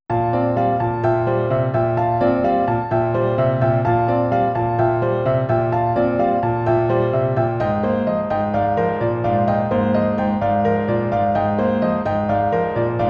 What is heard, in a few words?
piano; Kirbys Block Ball